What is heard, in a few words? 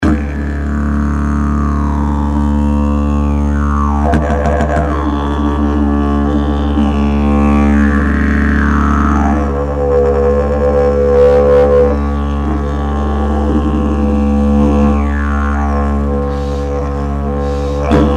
didge